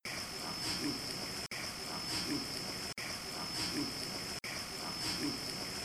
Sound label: noise